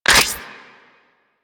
Shape Blast Shotgun Reload; Warframe; Weapons; Corpus

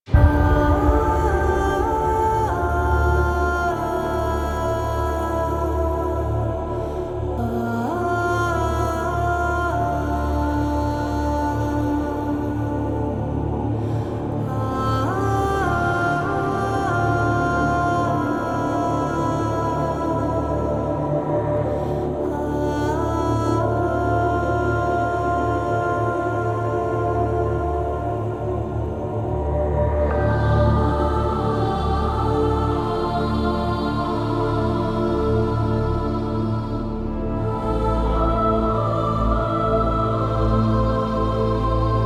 Nursery Back Music Mix; Operator Nursery; Warframe; Second Dream

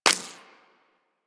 Bullet Impact Ricochet; Warframe; Weapons

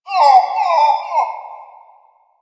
Creature, Ambience; Warframe; Quests; TheNewWar; Drifter Camp; Creatures

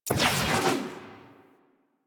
Camera Spot Move; Warframe; Chimera Prologue

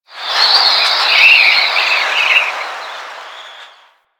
Mountain Pass Wind Gust Whispy; Ambience; Warframe; The War Within

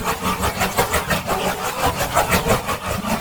Whip Block Loop; Enemies; Weapons; Warframe; The New War; Archon; Nira

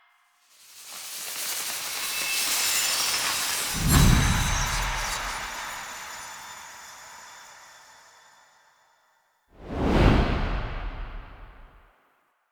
Quest Card Appear; Warframe; Jade Shadows; Quests

